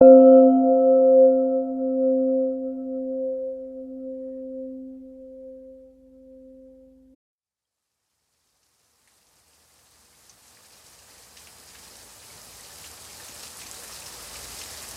Thundering Rainstorm, binaural

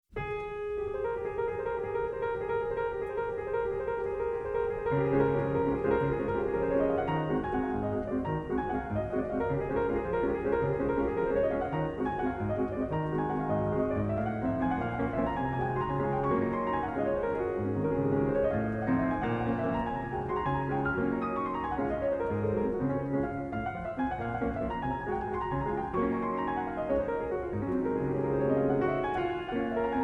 Minute Waltz In D-Flat Major Op. 64 No. 1, PIANO; Chopin

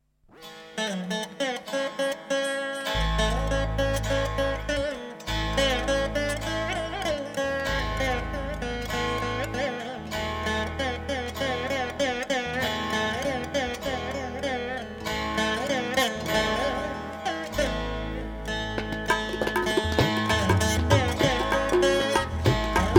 Folk Song, India, Sitar; India - 20 Sitar Favourites

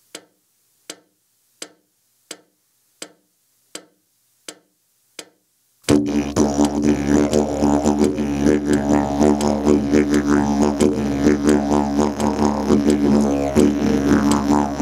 Didgeridoo Solo

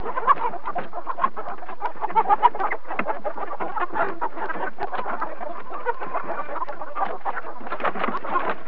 farm; GRSITES Graphics

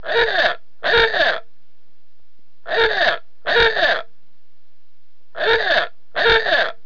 comic; GRSITES Graphics

